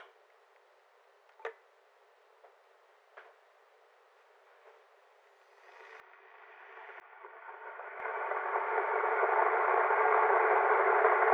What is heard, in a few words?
Hi-Fi not connected to transmitter with kettle boiling